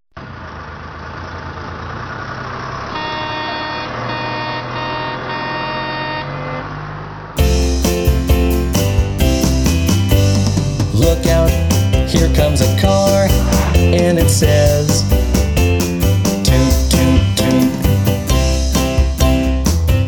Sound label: Toot Toot Toot, Preschool Percussion; 2015 ValueSeries